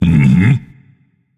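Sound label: non-verbal agree; smite; atlas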